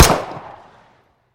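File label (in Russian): одиночный выстрел в поле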